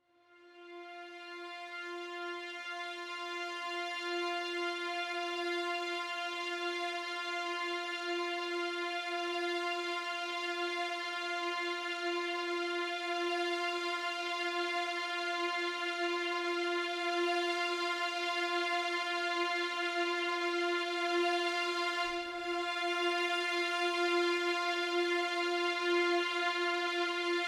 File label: 172 F Cinematic Atmos Strings